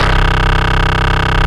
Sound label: FUZZ BASS C3; Techno Trance; Synth Basses; E-MU Formula 4000 Series Vol. 2